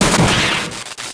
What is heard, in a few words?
explo sparks machine; impacts explos